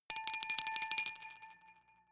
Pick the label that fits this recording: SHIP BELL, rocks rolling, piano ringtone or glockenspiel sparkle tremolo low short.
glockenspiel sparkle tremolo low short